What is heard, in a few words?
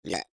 Burp; Google Snake; Poison